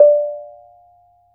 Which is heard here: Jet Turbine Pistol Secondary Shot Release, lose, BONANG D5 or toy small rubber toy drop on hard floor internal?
BONANG D5